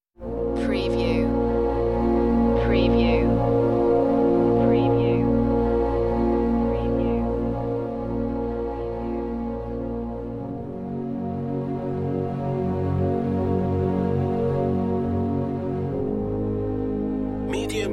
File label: strings only